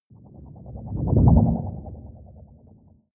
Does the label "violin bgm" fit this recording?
No